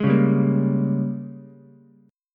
G sharp Major 7th Suspended 2nd Suspended 4th Ukulele; JGuitar